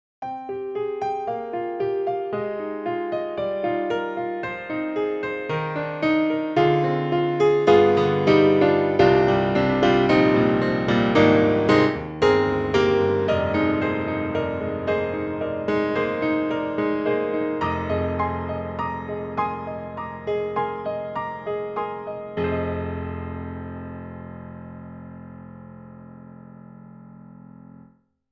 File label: Grand Pianos German D Bright Steiner; Synthogy; IvoryII